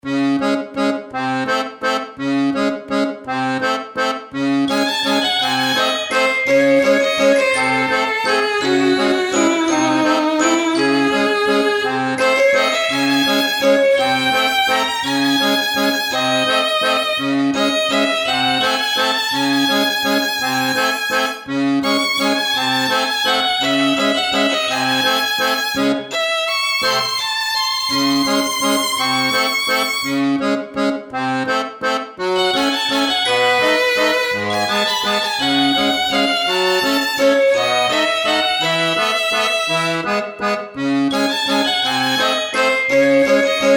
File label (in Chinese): 選單背景音效